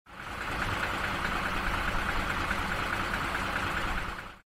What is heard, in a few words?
truck idling